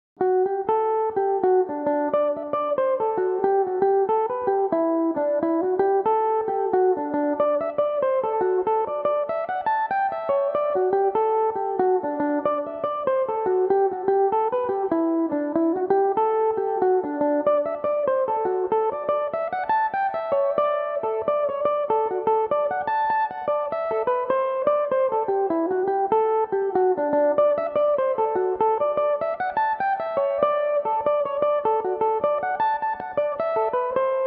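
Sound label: newtown bridge D major, irish mandolin; Belmuse EM-190 electric mandolin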